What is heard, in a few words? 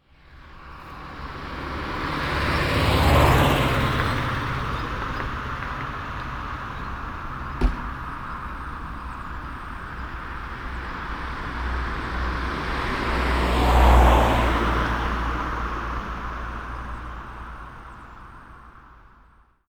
Cars Door Slam; field